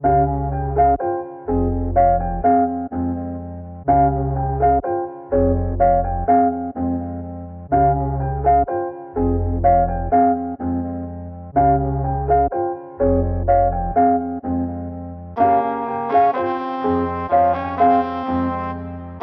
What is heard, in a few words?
125 BPM F Min, Melody, Trap; Cymatics; 2022 Melody Collection; Trap Pt 2